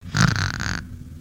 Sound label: Pet Cat Snore; creatures pets